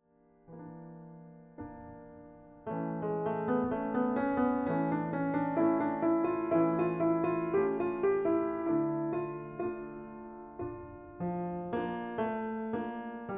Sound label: Adagio from Concerto No. 3 in D minor BWV 974